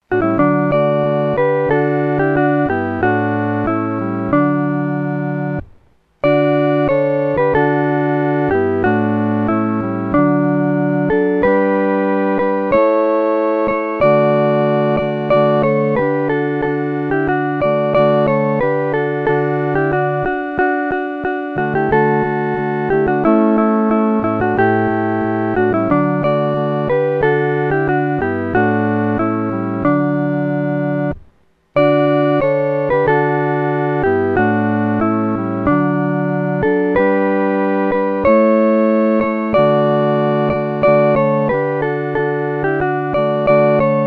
合奏 四声部

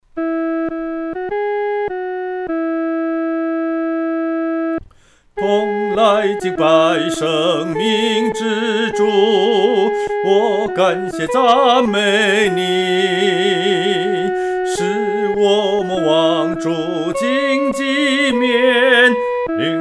独唱 第一声; 领我到各各他

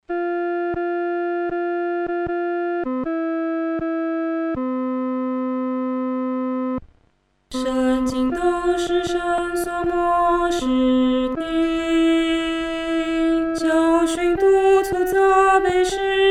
独唱 第二声

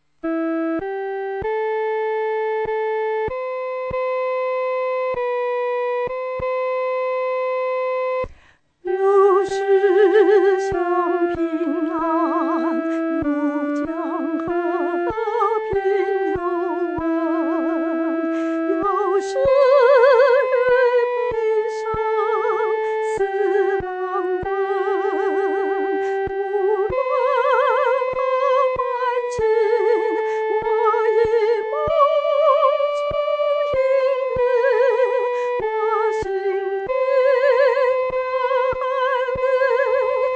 独唱 第一声

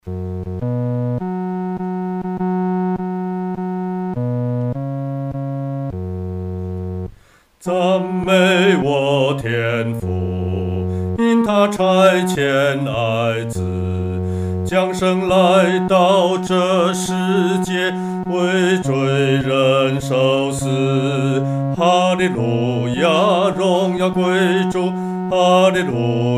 独唱 第四声部; 赞美我天父

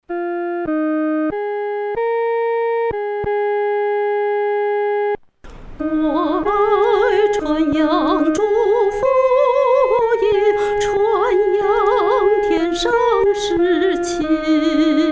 我爱传扬主福音 独唱（第一声）

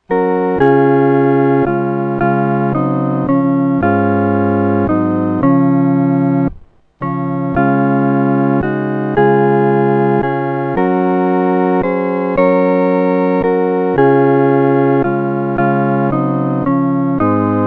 合奏 四声部; Sweet Hour of Prayer; William W. Walford, 1772 – 1850

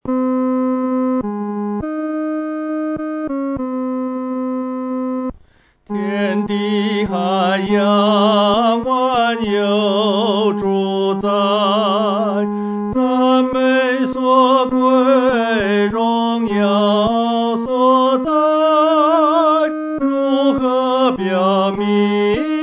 独唱 第三声

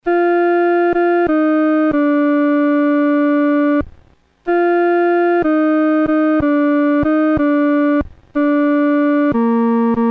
独奏 第二声

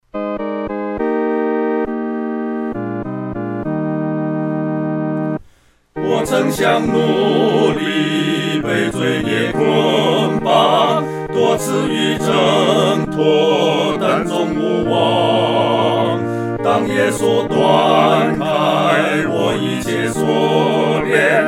合唱 四声部

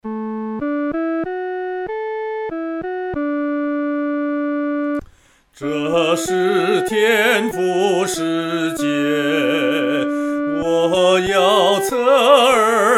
独唱 第一声; 这是天父世界

